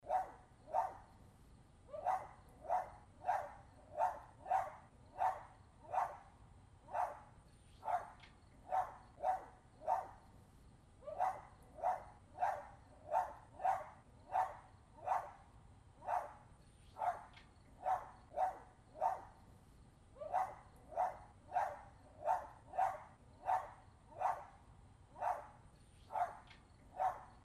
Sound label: yappy dog; Wayfarer